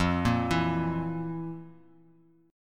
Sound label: F Major 7th Suspended 4th, chord; arpeggiated; x,8,8,9; JGuitar